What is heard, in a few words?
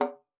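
E3, bass flute; Xsample VOL-2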